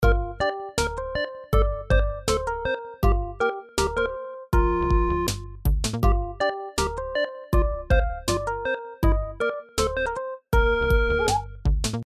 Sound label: BGM relax